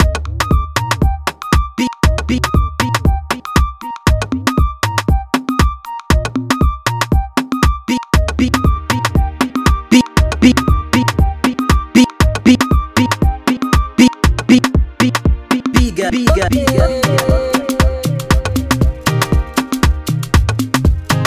Instrumental

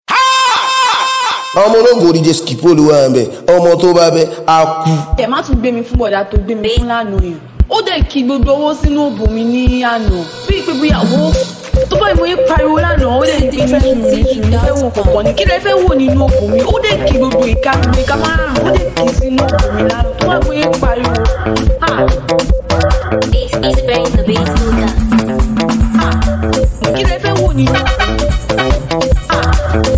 Freebeat Instrumental

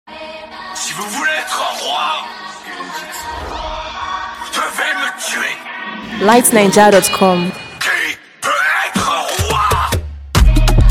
Afro Version

